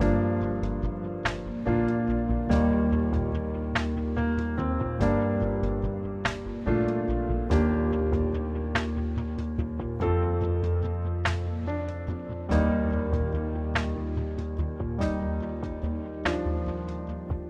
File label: Mood Normal Light